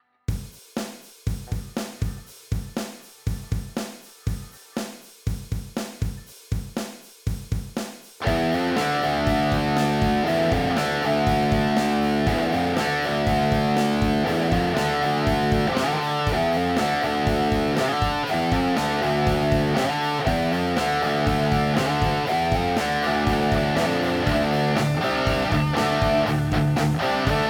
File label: Zoom G11 Hard Rock